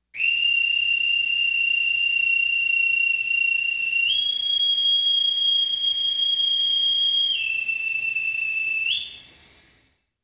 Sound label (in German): bootsmannspfeife sichern!; Hafen